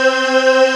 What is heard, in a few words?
EsRag Vox C3; Proteus 2000; Digital Sound Factory Proteus 2000